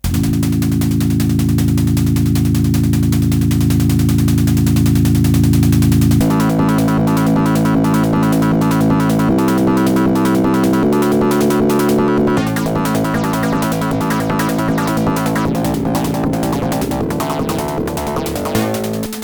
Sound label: ymf 715 s intel an430tx, fm; Yamaha YMF715-S (OPL3-SA3; встроенный звук на матплате Intel AN430TX); Примеры звучания FM-синтеза разных звуковых карт под MS-DOS